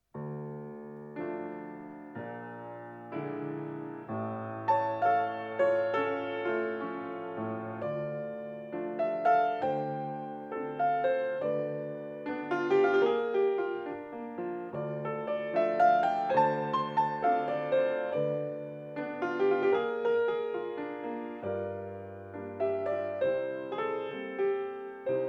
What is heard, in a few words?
training exercise music for ballet, pianoforte